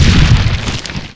Wind; easyrpg; Ib

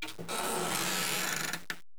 door old; easyrpg; WitchsHeart

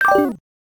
GUI storage hide party panel; Pokemon Essentials; PKMN Estelar 1.3

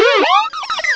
Cry; Pokemon Essentials; Pokemon Iberia V1.03

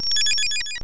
Flash; Pokemon Essentials; PKMN Estelar 1.3

